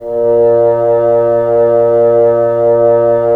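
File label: wind bassoon; LA Composer vol. 3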